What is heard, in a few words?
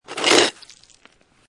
Snow And Ice Ice Or Snow Scrape With Debris; Weather